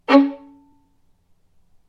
zvuk noti do diez pervaya oktava

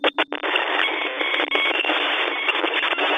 pomehi visokij korotkij

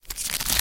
korotkij negromkij zvuk shurshashego fantika

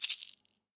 odnokratnoe vstryahivanie cilindricheskogo derevyannogo shejkera

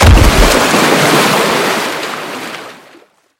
sumasshedshij grohot i moshnij vsplesk

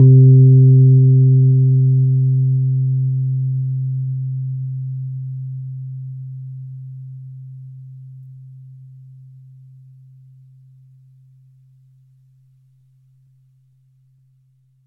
zvuk noti do v verhnej oktave

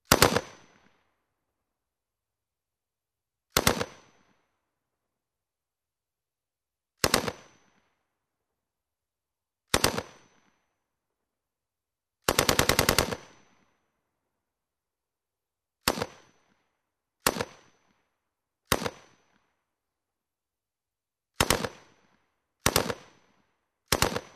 strelba iz avtomata perekryostnaya

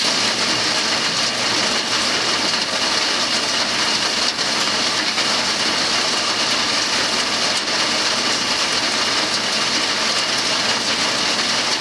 zapisano iz okna vo vremya dozhdya

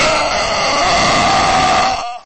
toshnota silnaya rvota

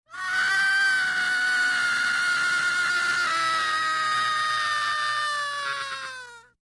krik malchika

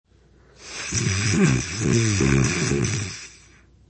hrap

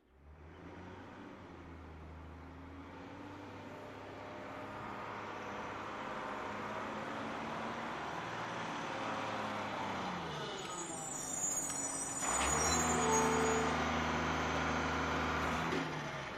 musorovoz zvuki musorovoza uplotnenie sdavlivanie.

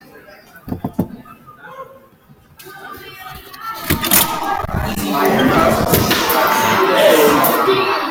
stuk otkritie dveri

